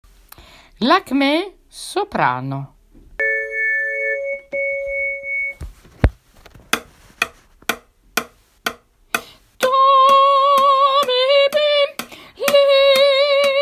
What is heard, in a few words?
soprano; lakme